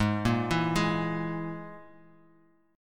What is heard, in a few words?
Ab Suspended 2nd Sharp 5th Ab Guitar Half Step Down